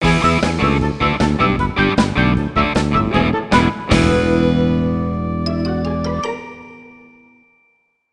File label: Bari Sax